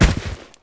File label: collide, grassy